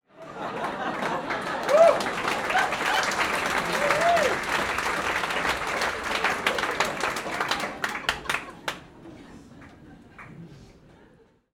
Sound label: human audience laughter and light applause comedy club x200 people; komedia brighton comic boom